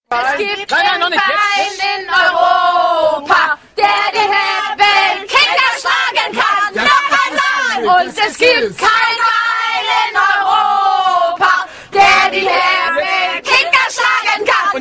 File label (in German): Hymne; Hebbelkicker; Kiel